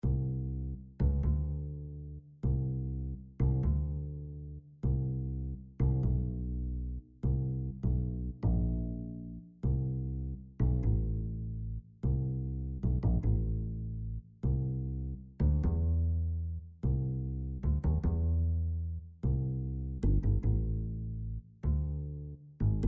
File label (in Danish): Jazz Bas twobeat; groove